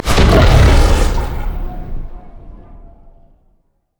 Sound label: battle roar; rengar